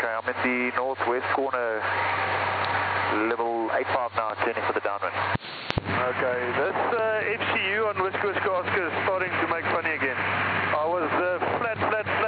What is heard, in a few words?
HDSDR 123453kHz AF